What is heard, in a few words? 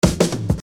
Fill; Construction Kit; HIP-HOP; Sonic Foundry (Sony Creative Software)